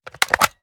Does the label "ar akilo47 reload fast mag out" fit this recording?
Yes